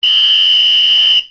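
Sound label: WHISTLE; Microsoft Office 95 Professional; VALUPACK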